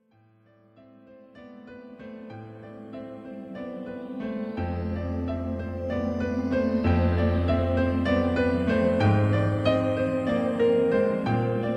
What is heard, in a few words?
windsong